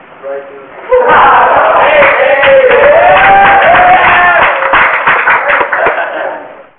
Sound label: clap